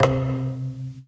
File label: sound design wooden clunk; dustyroom